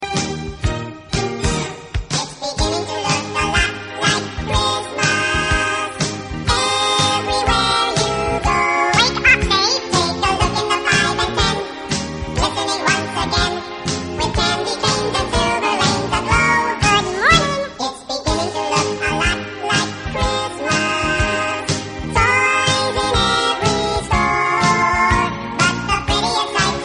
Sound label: christmas ring